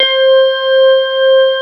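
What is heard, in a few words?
CX3 organ C5 L, Keyboards Old School; USB Soundscan vol.09 - Keyboards Old School; AKAI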